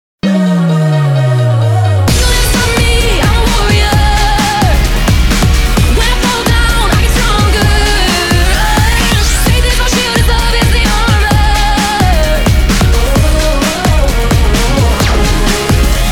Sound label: Cheer Tryout Music; Worley MS